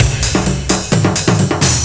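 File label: drum loop 130, left; House; Best Service ProSamples vol.10 - House; AKAI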